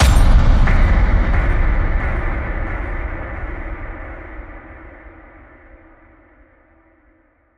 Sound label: Shielding Echo Hit